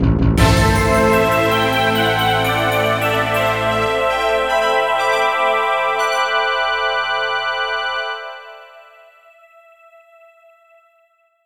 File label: Gameover; RPG Maker; Anime-Vidgames